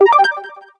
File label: New MMS; LG VS740 Ally